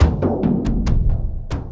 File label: Boonka Dooga Boonka, Drums, Frame, Kendang, Short; Sonic Foundry (Sony Creative Software); Friendly Fire